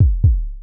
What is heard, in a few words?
Health Low